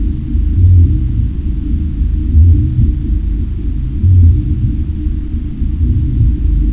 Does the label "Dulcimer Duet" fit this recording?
No